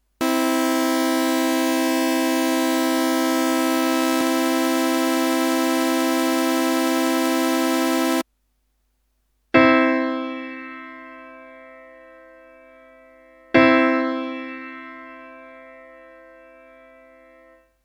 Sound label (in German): grosse terz; harmonie